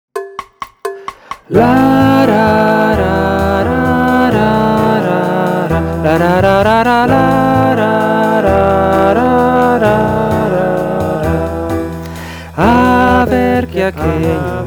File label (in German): Sopran